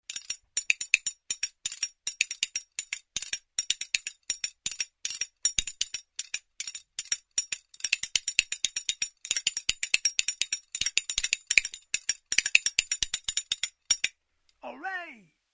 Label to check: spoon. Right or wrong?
Right